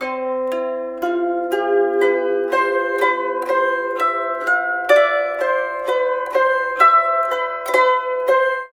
SITUR, SITUR PH; Spectrasonics; Heart of Asia

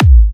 Kicks G#; markus hakala

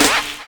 RAIN BEAT; Univers Sons - Kitch 70s

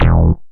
bass, synths; Rubber Chicken Software Sample Bank

